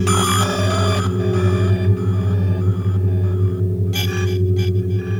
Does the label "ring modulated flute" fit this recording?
No